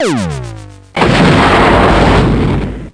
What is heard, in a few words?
Laser Hit Far; Kongbots 1.0